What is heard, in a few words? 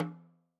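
Son Snare Off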